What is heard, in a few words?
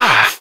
"ah"